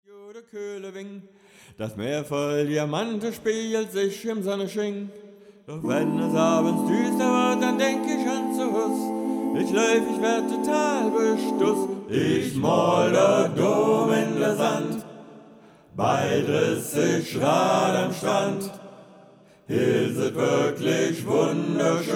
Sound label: Tutti Acapella